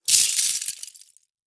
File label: Impact Plastic Shards Hit Concrete